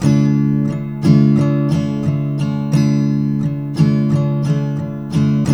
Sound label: TK MIN D#3, Guitars; Spectrasonics; Hans Zimmer Guitars VOL-1